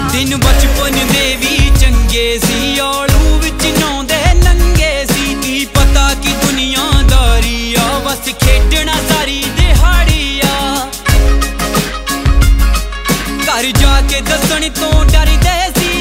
New Punjabi Ringtone

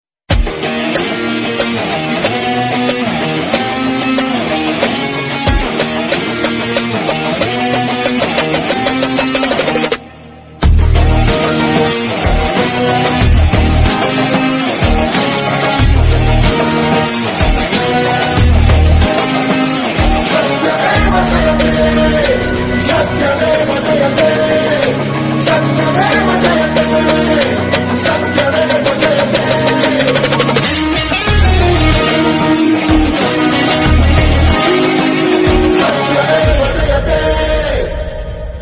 Teaser Bgm Ringtone; Vakeel Saab

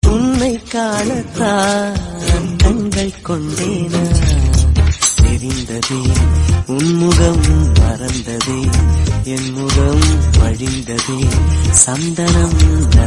Male Tamil Ringtone; ringtones

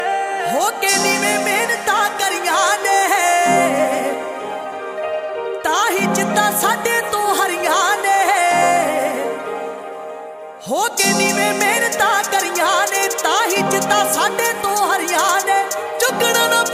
Devotional Punjabi Song Ringtone

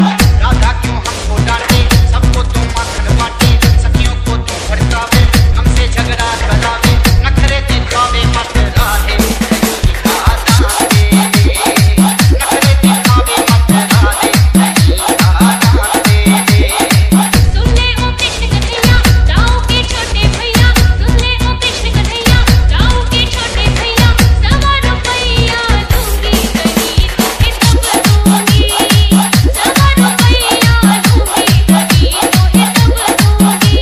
Navratri Ringtone, Hindi; ringtones

